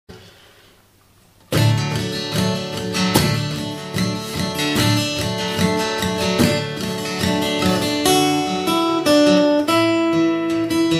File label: fingerstyle, guitar